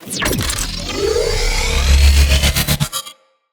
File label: Iron Blast Charge; Warframes